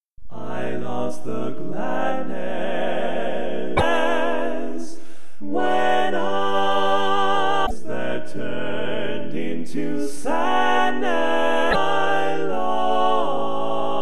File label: Lead, Lead Part; Classic Tags